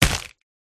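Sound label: wyvern attack contact up; viverna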